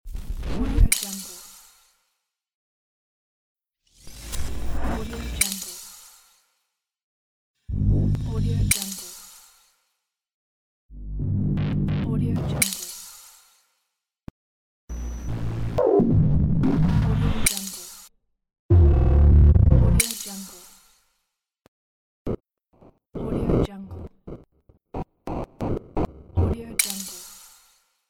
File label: amazing glitch